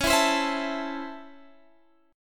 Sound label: C sharp Minor Major 7th Sharp 5th, Guitar, Half Step Down